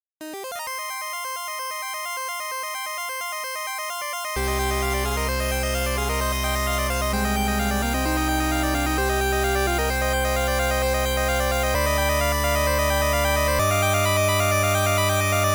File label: retro rpg op